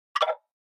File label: Button Wooden Cup Hit, buttons